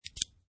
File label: USB Retract; Sliding retractable USB thumbstick back in; Computers (Sound Effects:Science Fiction)